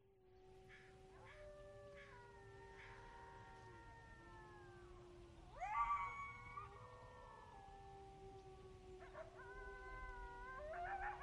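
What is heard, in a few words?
WOLVES DISTANT PACK HOWLS with RURAL ambience; wildlife sounds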